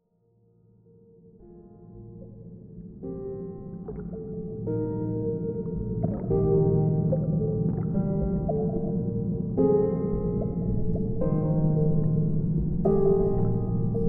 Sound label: Aqua's chord